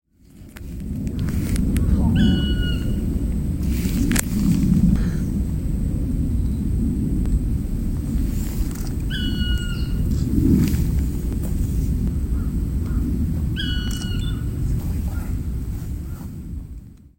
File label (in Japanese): kumagera, bird song